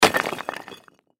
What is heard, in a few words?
Crash Rocks Drop Debris; Debris (Sound Effects:Materials); Blastwave